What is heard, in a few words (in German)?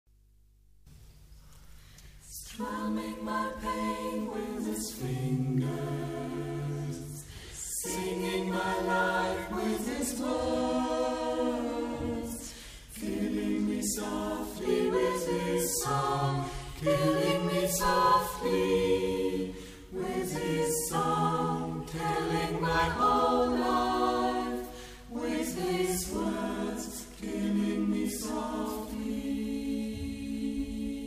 Vocal Ensemble